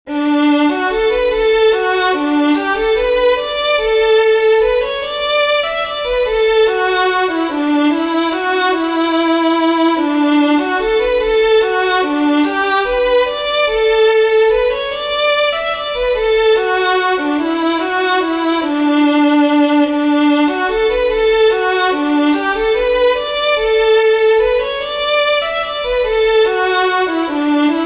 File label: WALTZ, fiddlers; SOURIS; southern kings fiddlers